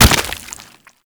Golem Gun Projectile Hit; Warframe

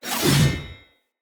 Sphere Dual Sword Whoosh Heavy; Weapons; Player; Swords

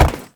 Step Perforated Metal, Movement; Uriel; Warframe

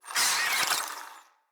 Infested Ashen Vocal Attack Death

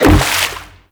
Aqua Board Land; Warframe; Yareli

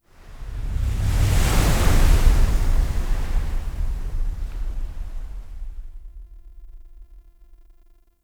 Intro Choice Stinger Start; Warframe

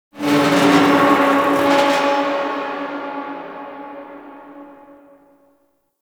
Stadium Metal Creaks Close; Warframe; Ambience; Nineteen Ninety Nine

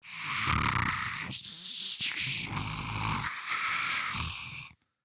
Breathing Grineer Ghoul; Grineer Ghoul Expired; Battle Barks

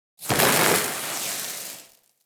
Spark Shower; Ambience; Props; Fortuna; Warframe; Venus Open World; Venus Solaris United

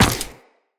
Run Ice, Footsteps; Tenno

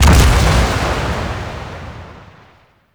Void Dragon Orb Proj Explosion; Enemies; Abilities; Warframe; Duviri

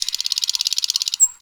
Forest Bird Rattle; Ambience; Warframe; Grineer Forest

